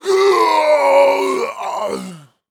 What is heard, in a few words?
Horror Scary Human Male Caveman Dying Scream